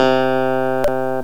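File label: clavinet; piano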